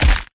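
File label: Stalker Movement Synced Melee Spinepunch; Titanfall 2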